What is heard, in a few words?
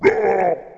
spooky, notes